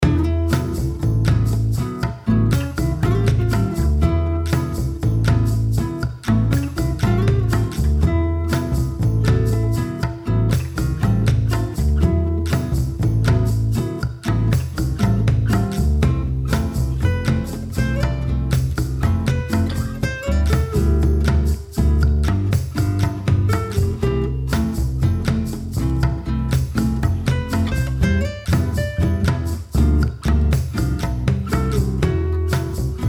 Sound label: bossa